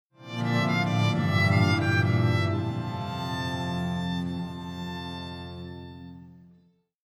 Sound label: violas consortium